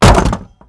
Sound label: wood; Mafie 1